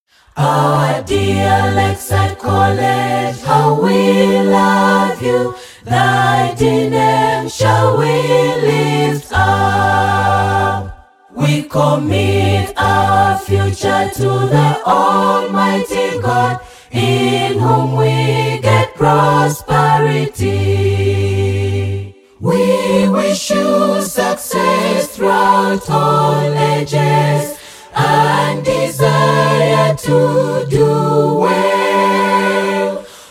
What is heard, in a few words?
SCHOOL ANTHEM; LAKESIDE COLLEGE LUZIRA